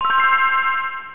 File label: receive